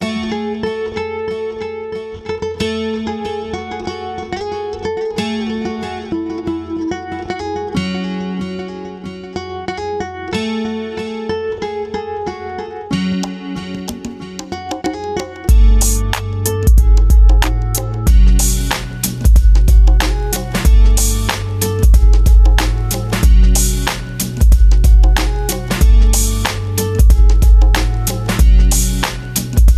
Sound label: latin gtr